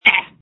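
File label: Scream, phone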